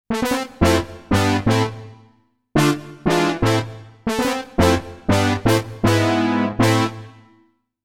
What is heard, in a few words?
pcm synth brass